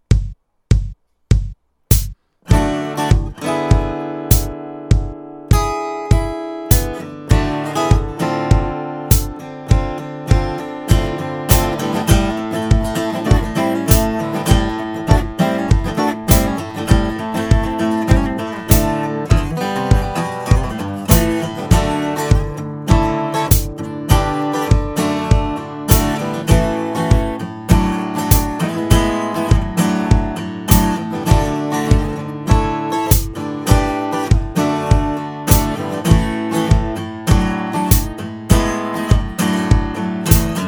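Zoom R4 MultiTrak Acoustic Guitar Internal Mic Condenser Mic Rhythm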